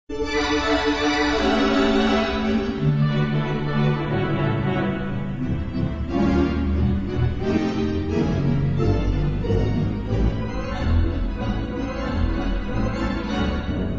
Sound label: theatre organ; mosque